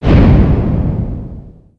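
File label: Collision Interior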